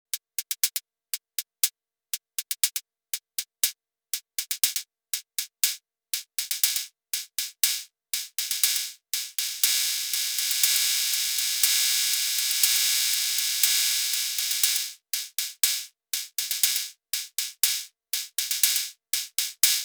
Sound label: Arturia DrumBrute Impact Cymbal